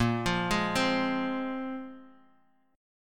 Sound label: Bb 7th Suspended 2nd Bb down Guitar Standard; Bb7sus2 arpeggiated; JGuitar